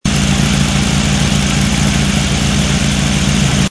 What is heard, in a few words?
vehicle moto move; mohaa